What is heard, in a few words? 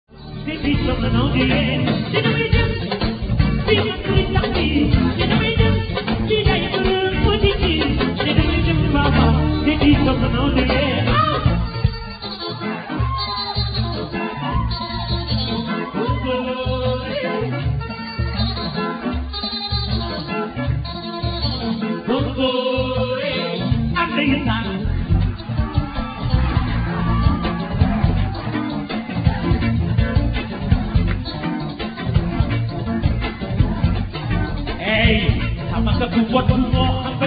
diapason